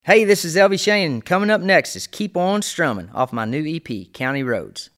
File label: Strummin